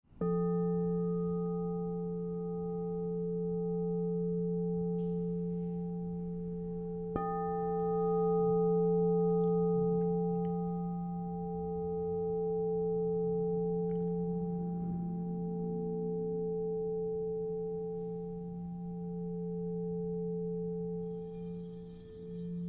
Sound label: Crystal Tones Singing Bowls